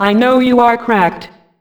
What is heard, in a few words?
CRACKED, Funky Vocals; USB Soundscan vol.18; AKAI; COMPUTER